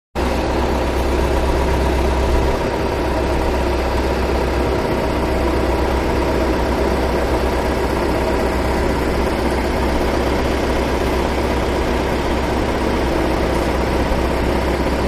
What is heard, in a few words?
Zamboni Idle Rink CU